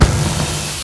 TOWER ATTACK; EMY

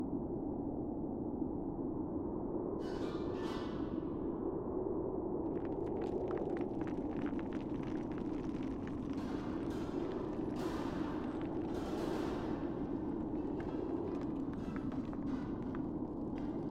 Ruins

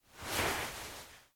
Rubbing; Still Life; windows

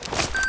MEDKIT; blockade classic

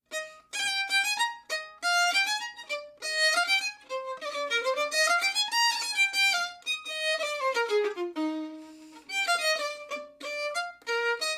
Violin; Assassin's Creed Syndicate

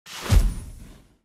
Roulette Card Ready To Up

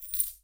Coins

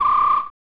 Whistle

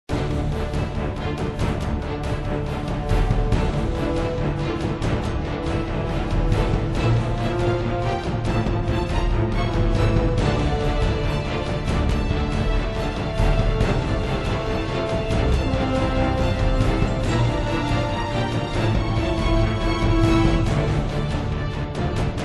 Fight

